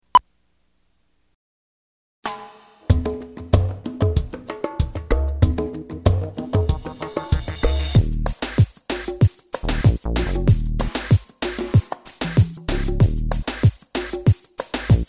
Backyard Beach; phineas and ferb; dadinator

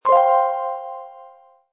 click

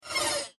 section Enter; starwars; episode vii the force awakens

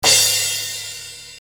crash

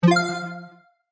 character roll

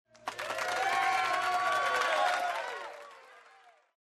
cheers; think fast

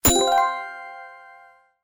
hit; be a hero

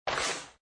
toybox close; toystory; toystorymania

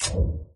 Start Game; john carter; lost symbol

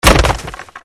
crash board; disneyxd; stuntastic

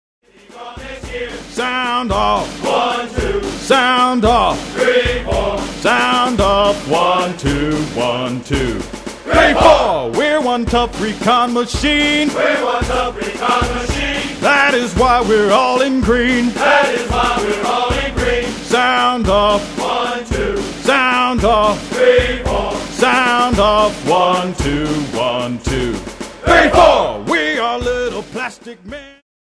sing along, cadence; toy story

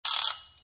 time up

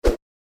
launch; holidays; snowbrawl

